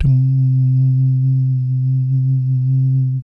BS DUMMP; Spectrasonics; Vocal Planet; Jazz FX

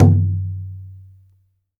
GENDANG F2, GENDANG DRUM; Spectrasonics; Heart of Asia

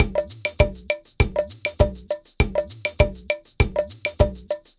Ensemble, Latin Percussion

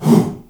HOO, SHOUT; Spectrasonics; Heart of Africa 1; CH. SHOUT1

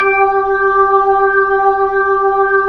B-3, Jazz

